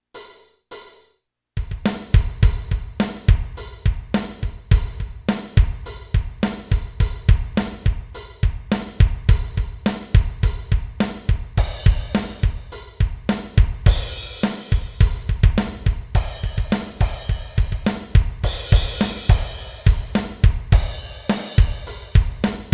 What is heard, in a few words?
drums; brick and mortar; waterboy 45 south